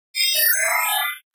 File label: display, trash collection